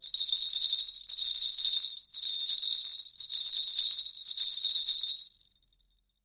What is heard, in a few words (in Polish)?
Janczary nożne; Instrument z 7 dzwoneczkami metalowymi i 7 kuleczkami drewnianymi doskonały do tańców dla; perkusyjne; Stuk i Puk - Dziecięce Instrumenty Muzyczne